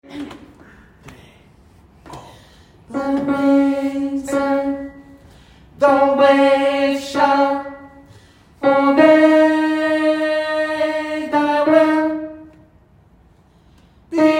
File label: alt, gospel; kongsberg; kor; gospel for alle